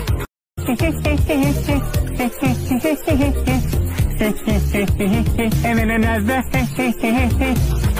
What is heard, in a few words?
peter griffin